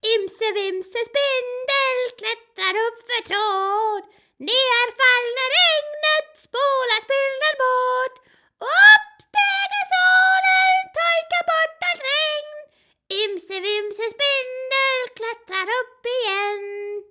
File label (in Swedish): lille peter edderkop svensk; SangeOverSundet SOS